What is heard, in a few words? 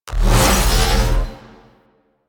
Fire Charge; Warframe; Cephalon Weave; Weave Boss